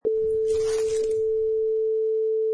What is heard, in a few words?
Flowers Picked Up